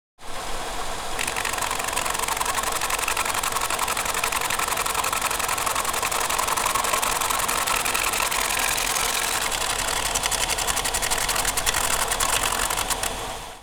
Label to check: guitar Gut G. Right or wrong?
Wrong